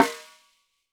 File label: Snare, Roland TD-20